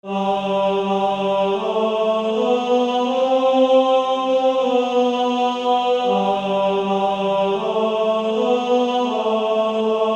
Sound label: Male Choir